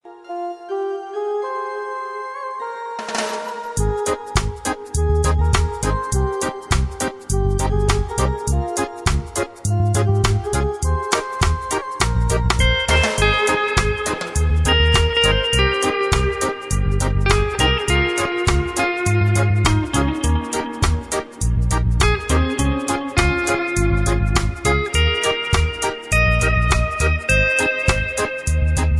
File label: Theme 80 Mix